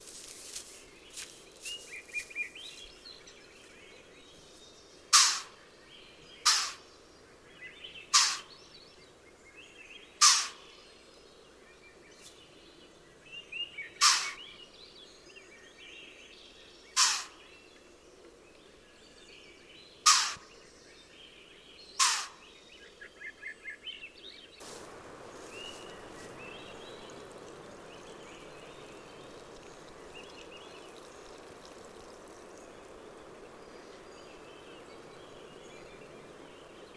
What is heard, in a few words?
Black Tailed Deer Bark